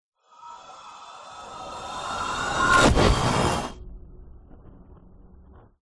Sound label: sound design whoosh horror beast breath impact stone